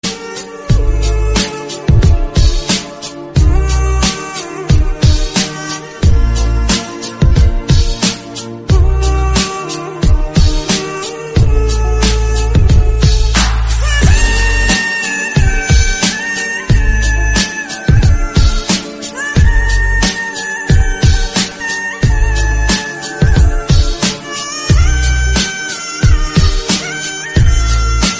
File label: sad instrumental background music